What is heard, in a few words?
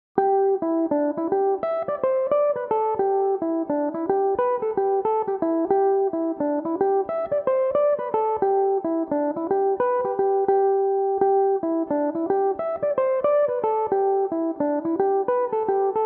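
G major, mandolin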